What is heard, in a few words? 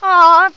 Awww; Riviera